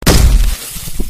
sci-fi gauss rifle

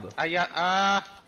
aia aaaa

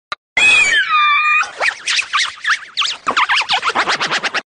kid laughs

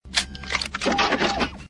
gun sling; robocop

